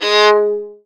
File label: G#2, Violin Marcato; Orchestral; DirectWave; Varazdin Orchestral